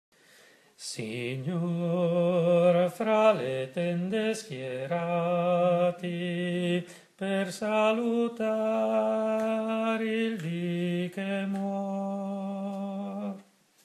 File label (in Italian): Canto del tramonto; GIOVEDI’ SANTO